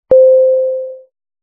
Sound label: correct